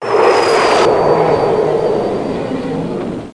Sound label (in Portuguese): jato; 7invasores